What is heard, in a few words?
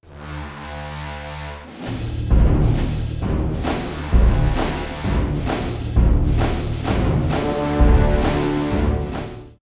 extra ball; DW How to Train Your Dragon